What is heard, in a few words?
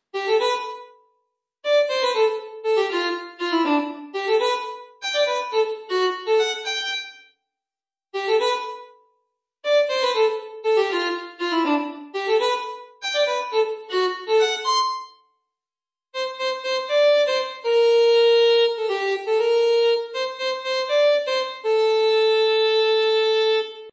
Violin